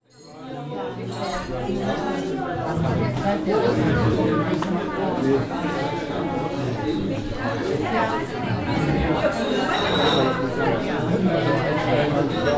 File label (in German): Im Cafe